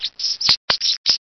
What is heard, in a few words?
Birdsong